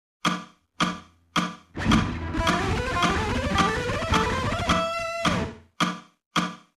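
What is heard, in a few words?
alternate picking fast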